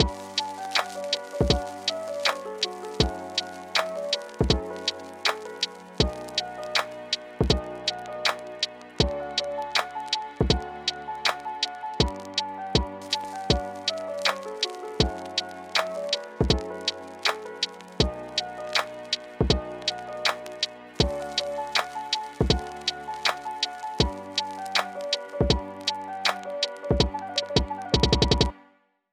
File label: LoFi Hip Hop Beats; grand dad